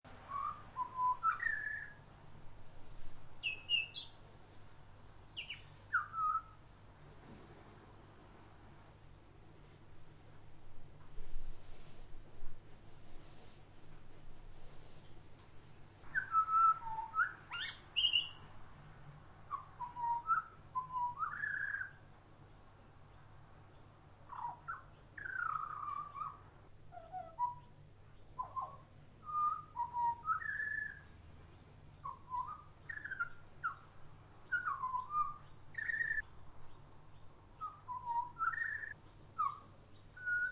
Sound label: butcher bird singing